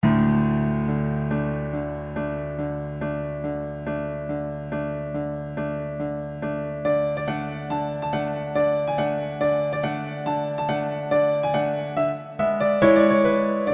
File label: Romanian Folk Dance in D Major, Romanian Polka; éla Bartók; 6 Romanian Folk Dances